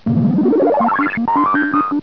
hammer; DK

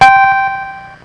coin up

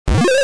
Popped Monster, arcade; Dig Dug

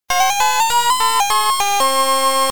Game Over Music; arcade; Dig Dug

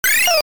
Pumping, arcade; Dig Dug

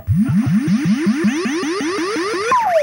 Ride the disk; qbert

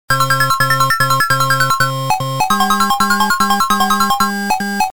Name Enter; arcade; Dig Dug

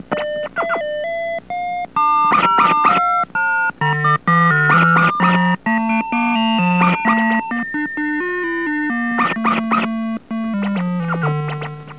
BIRD

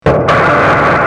Boom

